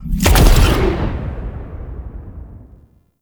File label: Iron Blast Cast Fully Charged; Warframes